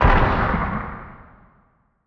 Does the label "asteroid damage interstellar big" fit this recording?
Yes